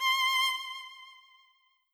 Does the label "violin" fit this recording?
Yes